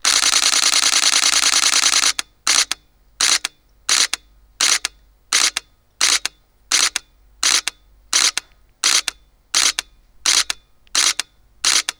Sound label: Sony Alpha SLT-A55 continuous speed priority